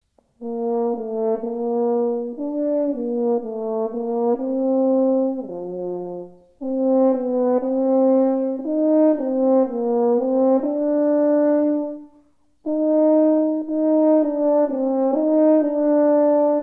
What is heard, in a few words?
horn